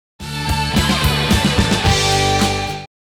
stinger

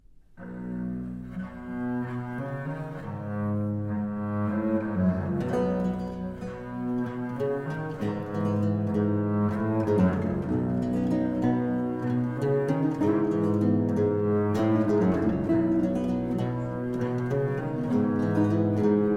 Requiem aeternam Chant

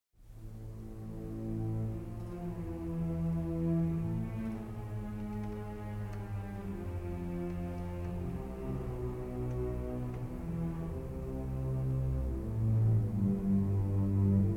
Violin Concerto No. 1 in A Minor Nocturne Adagio

